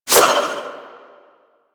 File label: Army Replicant Proj Throw; Warframe; Warframes; Hoplite